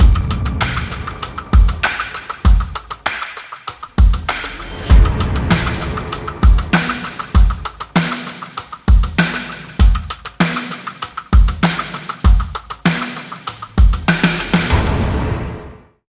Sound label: Drums and SFX